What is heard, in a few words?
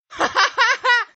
Ha Ha Ha Hispano